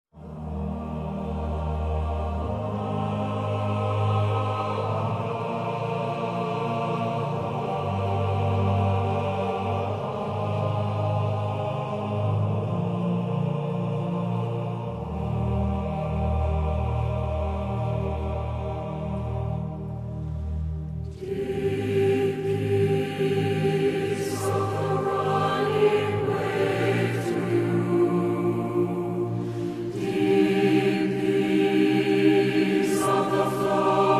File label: deep peace choral